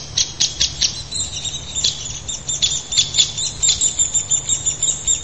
Dryobates pubescens; Chordata; LandBirds

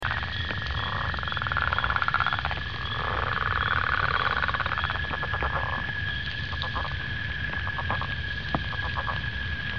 Lithobates pipiens, Amphibia

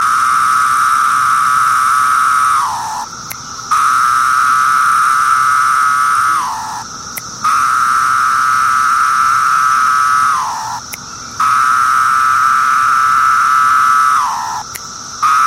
Magicicada septendecim

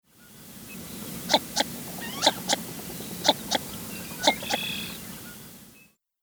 Spatula clypeata, water birds; Chordata

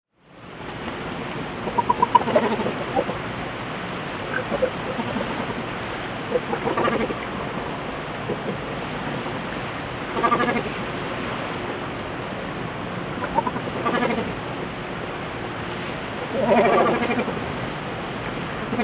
Phalacrocorax carbo, water birds; Chordata

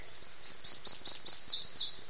Protonotaria citrea; Chordata; LandBirds

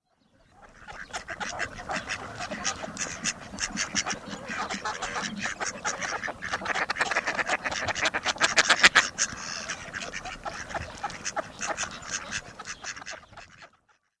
Anas platyrhynchos male; Chordata

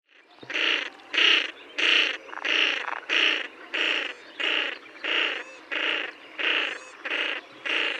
Aythya ferina, Water Birds; Chordata

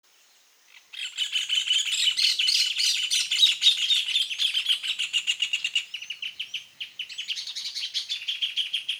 Furnarius rufus; Chordata; LandBirds

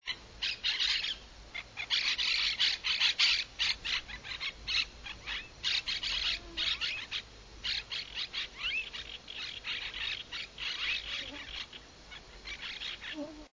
Myiopsitta monachus; Chordata; LandBirds

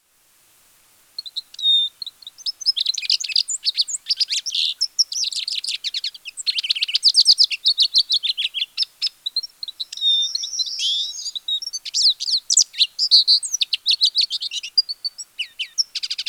Spinus psaltria; Chordata; LandBirds

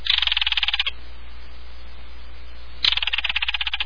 Megaceryle alcyon; Chordata; LandBirds

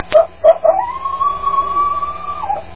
Canis latrans, Mammalia; Chordata

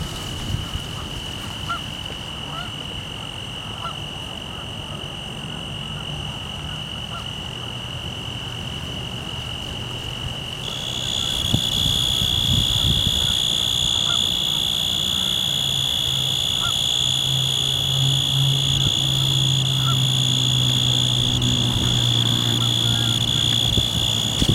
Oecanthus forbesi

